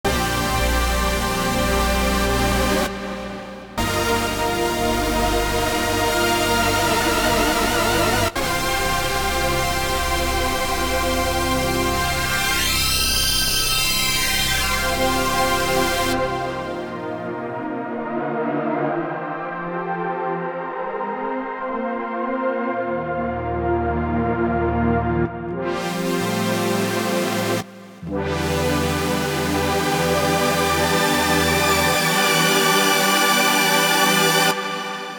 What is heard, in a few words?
Yamaha Montage M Dual Super Saw